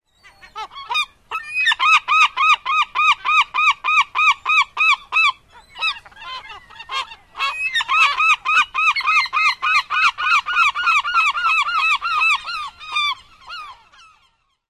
laugh-like outbursts

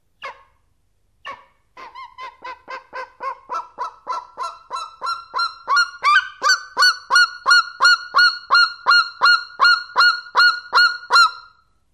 calls of captive

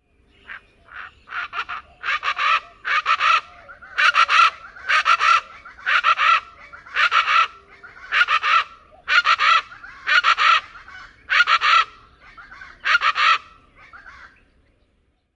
male close up; TX

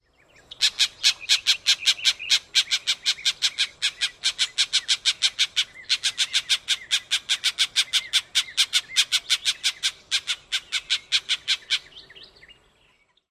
harsh calls, extended series; TX

